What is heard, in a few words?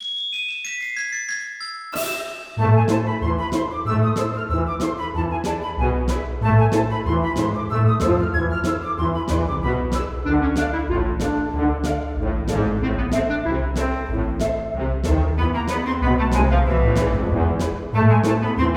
Mini Game Orchestrated; Video Game Music